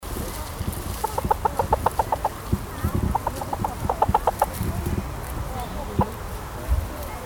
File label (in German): Huehnchen Zoo; Reijkavik